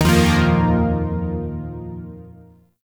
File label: Shawzin Pent Min Chord E; Chords; Warframe; Zariman